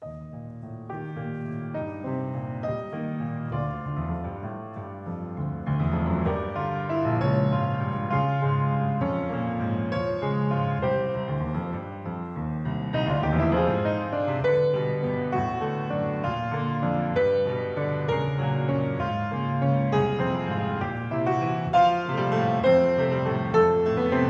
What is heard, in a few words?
Piano